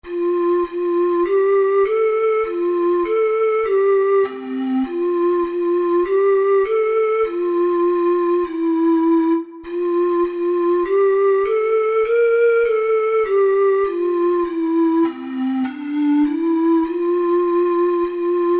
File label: yankee doodle, ocarina six hole